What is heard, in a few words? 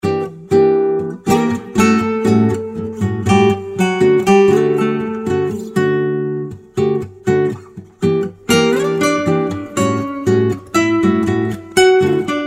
Smooth Sax and Guitar